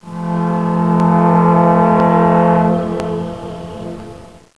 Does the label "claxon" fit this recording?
Yes